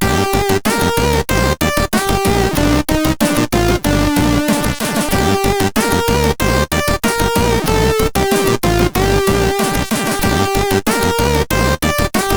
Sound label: Power sid CSG8580R5